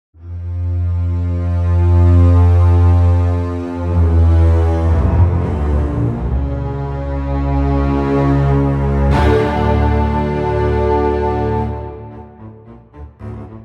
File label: solo and orchestral strings; SE VOL 1